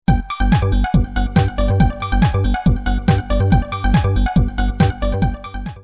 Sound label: hit